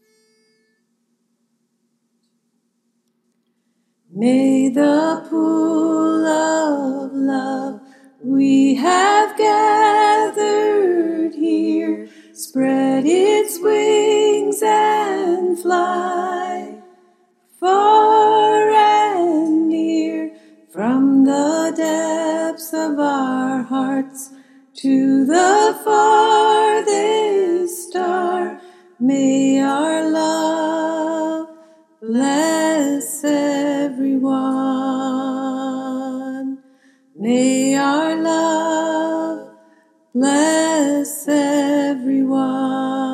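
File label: soprano, choir; freedom choir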